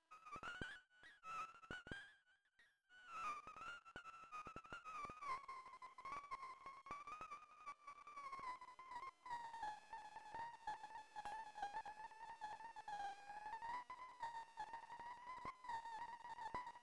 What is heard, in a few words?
noise simplex am